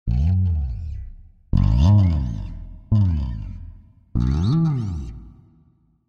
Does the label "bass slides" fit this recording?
Yes